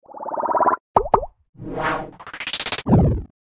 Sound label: Infographic graph build up pops whooshes